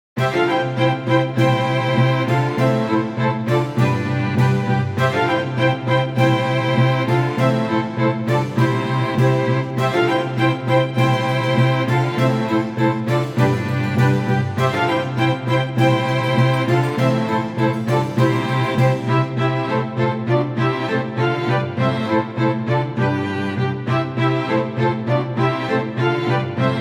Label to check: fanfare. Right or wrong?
Right